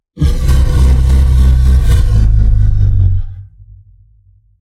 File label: Forest Titan sound